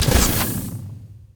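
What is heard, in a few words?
enchanted crystal arrow; LoL; ashe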